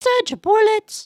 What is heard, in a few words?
sway, emotes